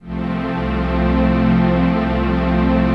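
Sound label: trance pad; Best Service; XX Large - Pads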